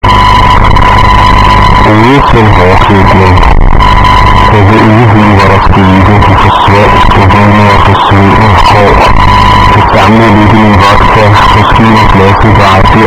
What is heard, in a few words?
Distort